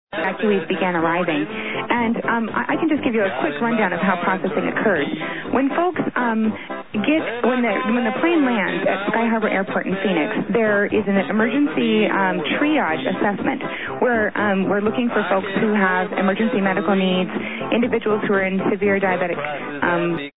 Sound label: with band